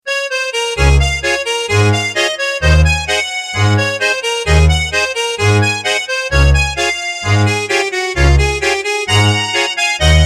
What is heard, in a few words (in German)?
Diemtigtaler Polka